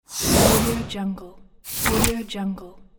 slide swoosh

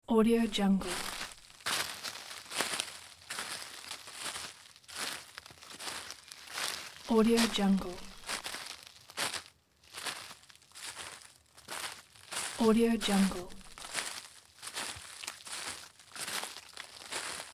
walking on dry leaves

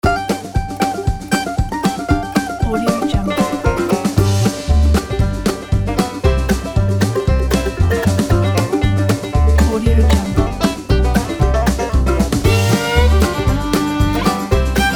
bluegrass country; audiobeats

